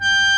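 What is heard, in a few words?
sheng F#3; AKAI S6000 CD-ROM - Volume 1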